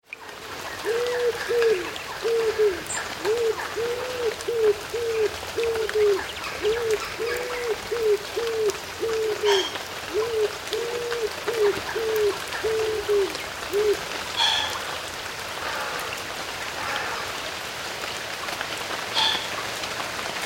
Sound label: Woodland Birds; Country birds with rain on foliage; Animals